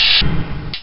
Doorshut

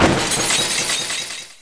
Newglass

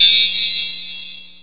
hi gong

